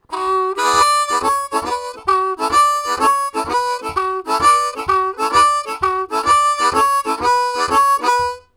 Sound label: Boogie Shuffle, Harmonica; Zero G